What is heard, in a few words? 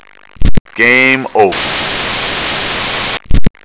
die